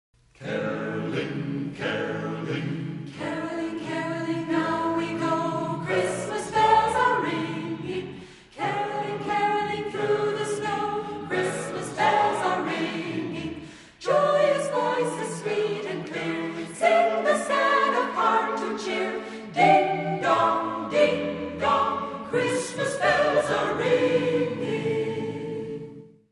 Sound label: Caroling